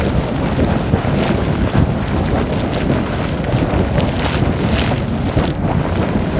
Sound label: lava spout; The Elder Scrolls III Morrowind GOTY; XBOX